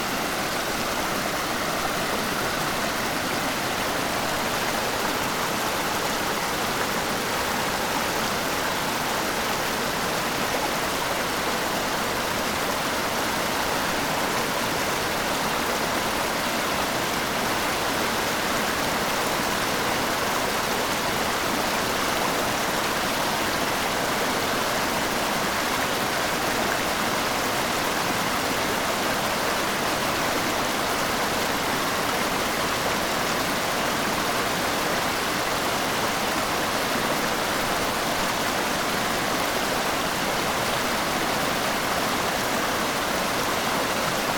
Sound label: riverside